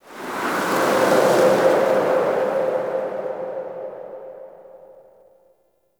Exterior Forest Wind; Warframe; Ambience; Duviri

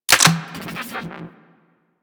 Shock Rifle Mech, Weapons; Warframe; Corpus

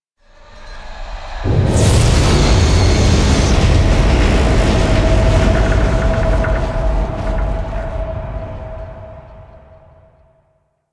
Solar Flare; Warframe; Quests; The New War

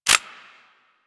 Hand Cannon Insert Clip, Reload; Warframe; Weapons

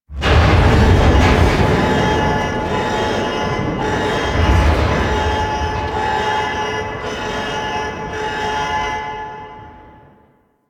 Dropship Hull Damage; Warframe; Veilbreaker; Devourer Kahl

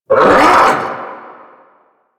Vocal Attack Short; Warframe; Duviri; Dax; Vocal Set A

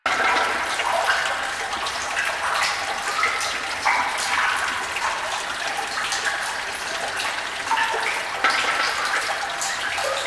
Exterior Manhole Cover Loop; Ambience; Props; Warframe; NineteenNinetyNine

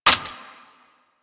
Lever Action Pistol Mech; Grineer

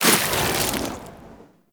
Inf Boomerang Melee Throw A; Warframe; Weapons; Infested

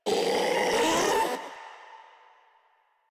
Infested Ancient Vocal Attack

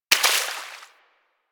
Bullet Impact Debris Water

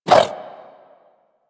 Inf Throwing Star Whoosh, Infested; Warframe; Weapons

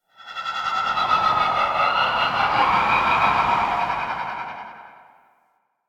Void Connector Shriek One Off, Ambience

